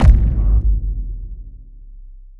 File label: Alien Bass Kick Drum; Custom Nova Area 51; Nova Sound